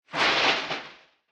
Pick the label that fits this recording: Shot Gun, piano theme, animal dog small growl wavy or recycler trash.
recycler trash